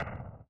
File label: thud crash; angband